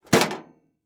Sports Grill Metal Cover Closing

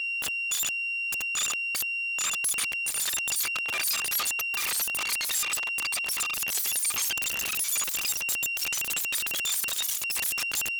Modular UI Micro Gestures; SoundMorph; Richard Devine; GDC Game Audio Bundle

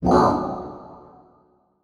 Title Transition Blurp; Adobe; Production Element

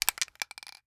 Industry Spray Paint Shaking Can

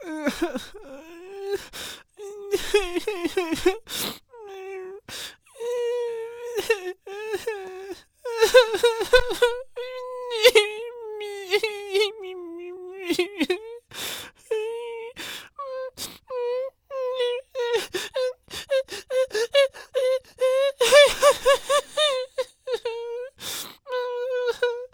Human Male Boy Crying

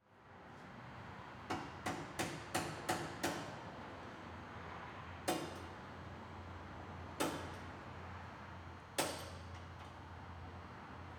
Industry Ambience Auto Repair Shop Door Open

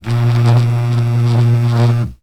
Technology Electronic Cell Phone Vibrate On Wood

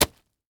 Impact Wet Juicy Short; Impacts

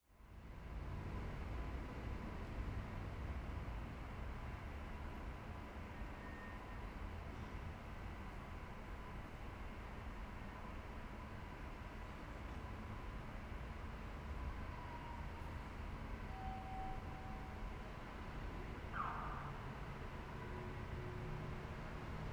Ambience Parking Structure; AdobeCC

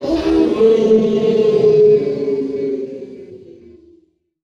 Title Transition Descend; Adobe; Production Element

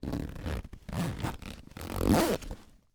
Sports Bag Duffle Bag Zip Up

